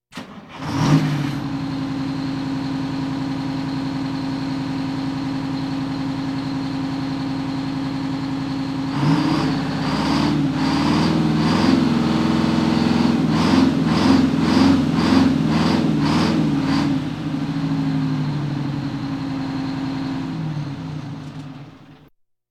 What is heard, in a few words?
Police, Fire engines